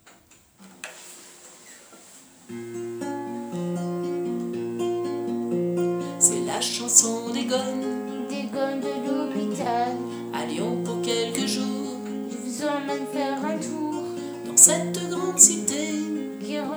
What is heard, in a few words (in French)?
Chanson